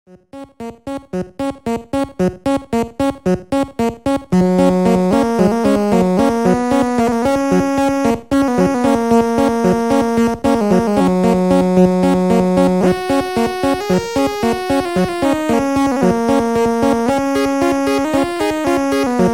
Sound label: Stylophon